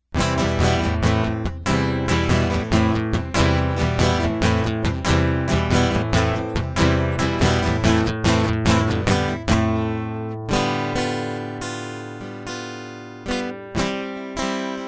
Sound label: Searching Guitar Loop